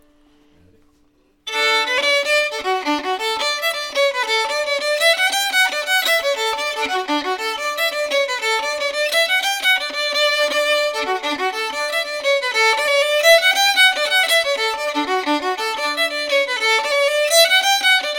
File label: fiddle; father oflynn